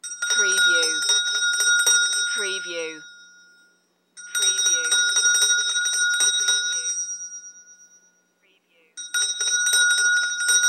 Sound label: Indian Bells; Casmir